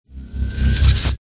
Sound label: disembowel effect; abilities